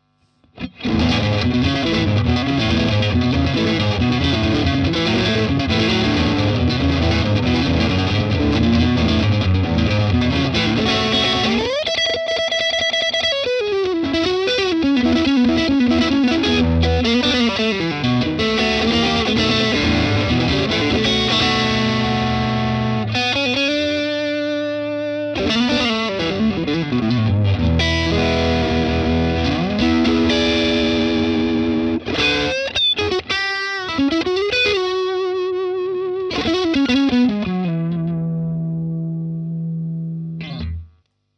Lead Strat

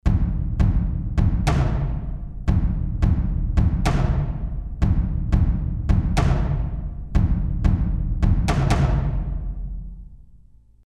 Mooer Synth Drum Patch War Drum

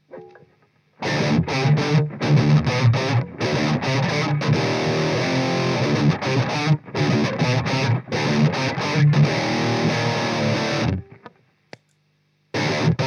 Riff; REVV G series

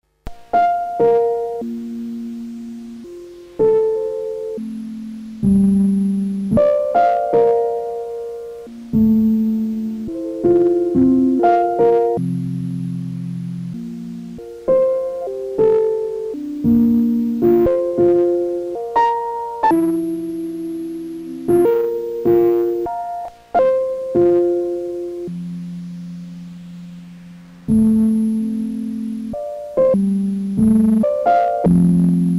Piano Sample; Bastl Microgranny 2; Monolith

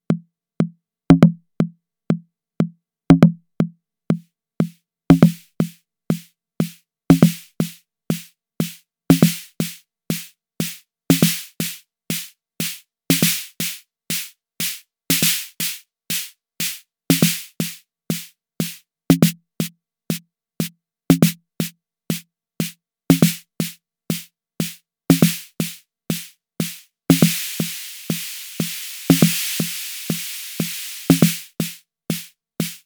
Arturia DrumBrute Impact Snare 2

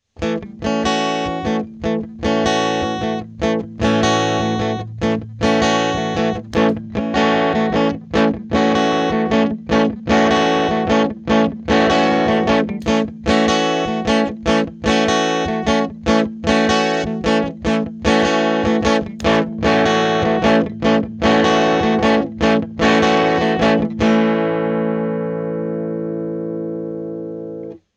RedFox Crunch

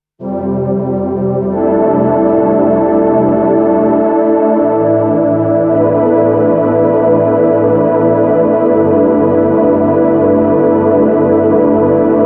synth Pro 12 mushy

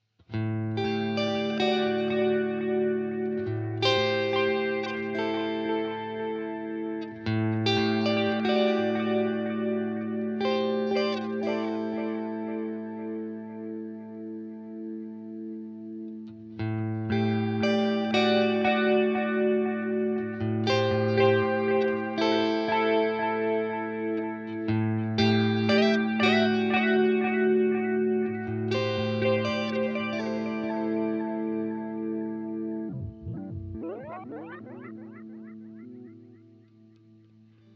Indie Tape Delay riff; VALETON Dapper

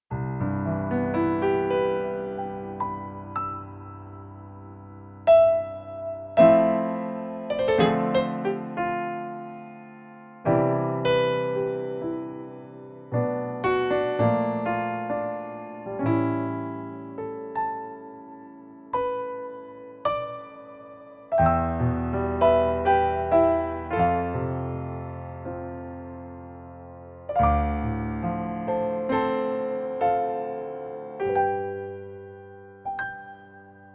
Yamaha YC88 C7 Piano

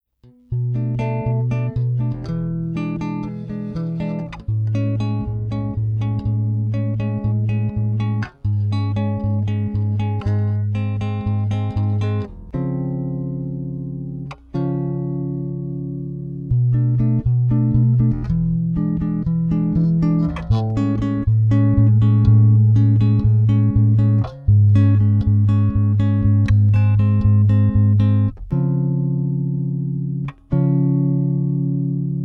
Spector chordstyle Bridge PU Neck PU Both PUs

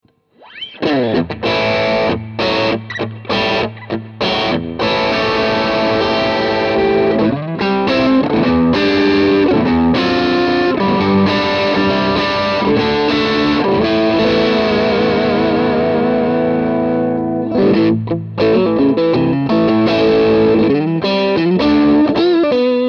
Vintage Gain Strat

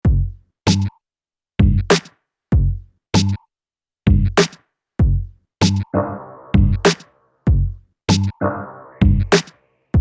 filter dope 404

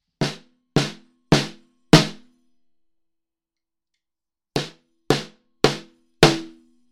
Magic Pre Snare e606 color; Fredenstein

